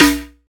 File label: snare drum sound D key, acoustic snare sound tuned to the D note; snare drum samples